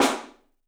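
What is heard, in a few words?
PICOLO SNRS, snare, drums; 3D Sound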